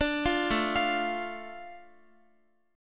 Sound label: A Suspended 4th Sharp 5th, Guitar; Asus4#5; arpeggiated